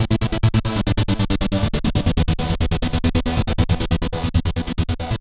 Progressive Synth, Trance; Sonic Foundry (Sony Creative Software); Trance NRG VOL-2; Progressive Synths

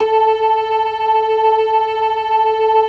LO MANUAL VIB, Organ

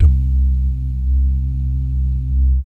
BS DUMM, Vocal Planet Jazz & FX; ILIO; BASS DUMMS

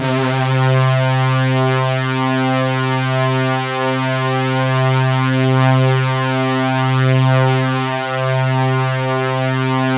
C2, Synth Line; 90 s Sample CDs; NiceBeats; SynthLine VOL-26

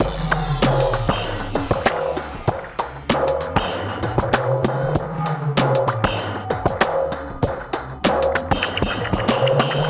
Drum Loops Processed; Zero-G Smoke

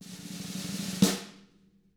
SNARE ROLL; ILIO; Double Platinum Drums 1; SNARE ROLLS

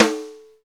SNR, Roland; Real Snrs

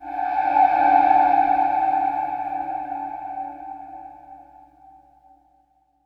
IMPACT SCARY, Cinematic Impact

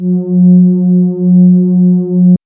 MOOG A 5; AMG - Vince Clarke Lucky Bastard

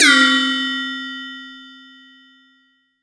Perc

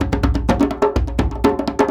Percussion Loops, Bongo & Conga; Houseworx

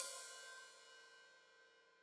Hat Open, Hi-Hat; Deeply Sampled Drums

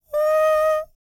HUMAN TRPT, Jazz FX; Spectrasonics; Vocal Planet

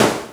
snr; Stereo

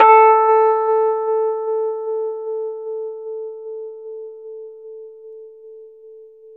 Rhodes 73 Slow, A; E-MU Producer Series Vol. 5 – 3-D Audio Collection; 3DRhodes

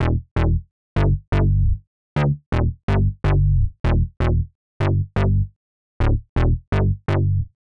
Synth Bass E, 125; PowerFX - Nu Jazz House

